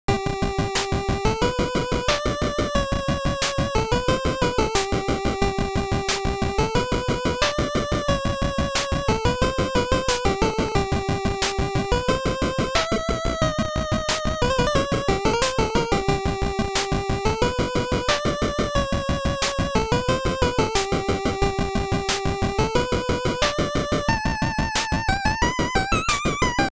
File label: Whimsy Walking; CC0 Scraps